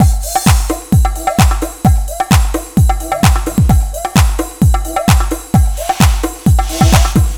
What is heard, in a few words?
House